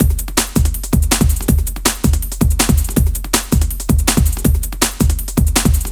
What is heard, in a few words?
162, breaks; M8